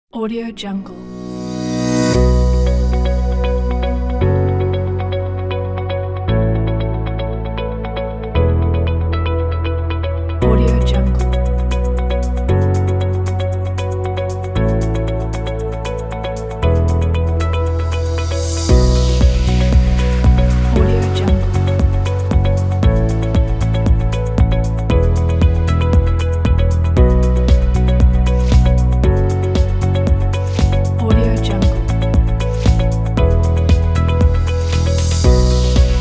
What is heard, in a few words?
serious corporation; nika studio